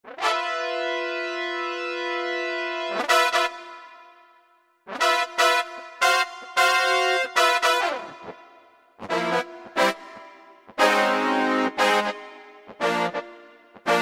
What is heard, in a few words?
Hyper Brass, Yamaha PSR-3000; Factory Voices